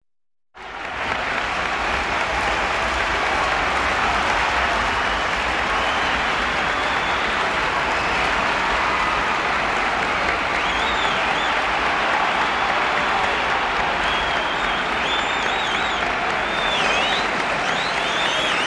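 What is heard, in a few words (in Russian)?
шумная толпа на стадионе аплодисменты свист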